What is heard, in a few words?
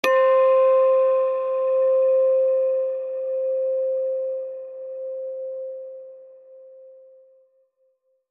Low Bell Ping